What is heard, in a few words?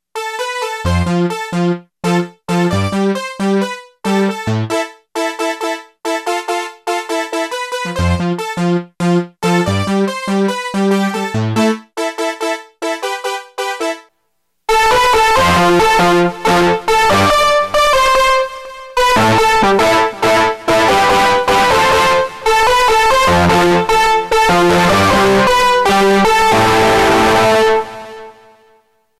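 distortion + delay, Yamaha REX50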